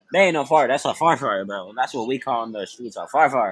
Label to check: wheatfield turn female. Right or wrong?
Wrong